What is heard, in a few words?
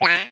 Monster Open; Cut the Rope Holiday Gift; android ios mobile gamerip